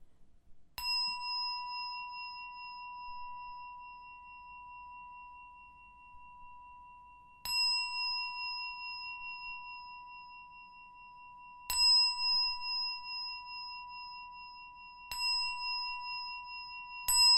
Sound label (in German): Klangschale